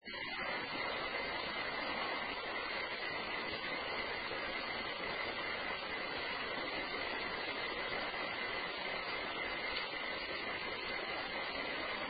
Fuel Building First Floor Unexplained Possible gun shot; Case 024 Pocahontas Fuel Building II